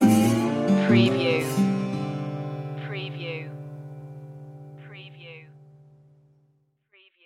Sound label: stinger